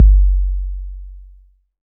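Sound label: kick, TR808; DRUMMACHINES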